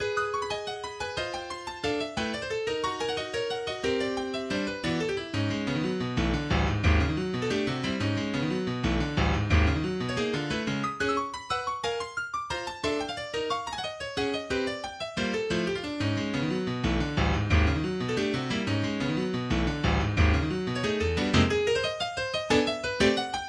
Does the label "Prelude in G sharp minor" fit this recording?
Yes